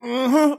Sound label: mmm hmm